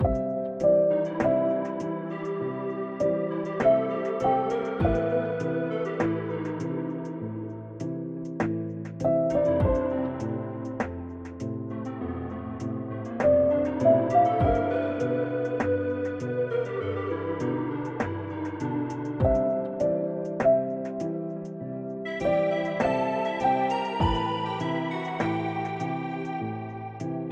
Drifting LOOP; bakemono demon brigade tenmen unit 01

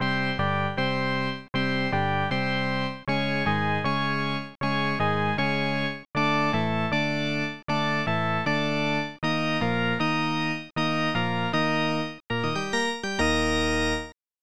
Stadium Organ; ESPN National Hockey Night; snes

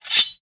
metal slide metal; gamerip; shattered horizon 2009

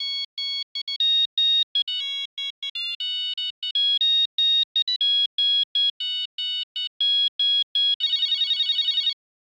ringtone funky; murder by numbers; 2020 switch gamerip

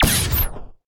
Bio Gun Shot

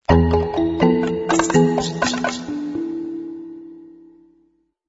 Music Theme

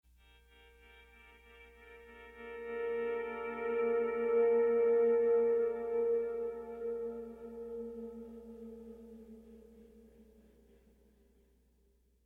Pleasant Guitar; The Last of Us; ML Pleasant Guitar Mood

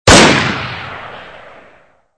snipe

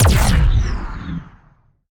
Shot Large Blaster Warped Zapper

